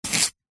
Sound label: carrot chop knife single; food